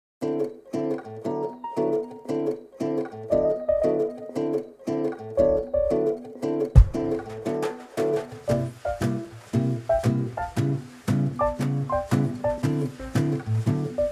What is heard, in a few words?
Flanger